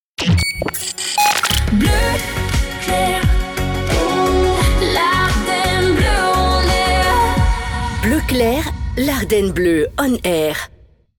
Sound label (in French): Jingle Chante Theme ID; BleuClair